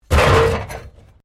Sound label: WRECK METAL ALTERNATER CRASH, Wrecked Cars